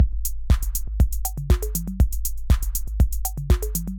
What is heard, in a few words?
Beat